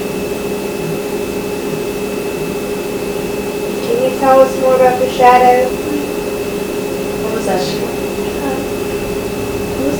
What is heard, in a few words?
Kitchen Unexplained Very low female whimper AMPLIFIED